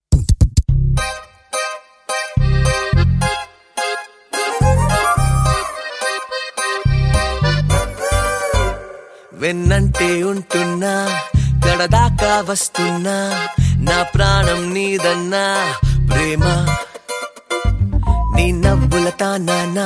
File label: Telugu Ringtone